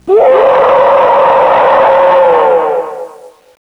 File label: jumpscare; zoochosis; deep sea; lazynap